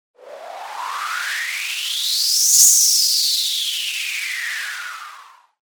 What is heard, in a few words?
RISING WHOOSH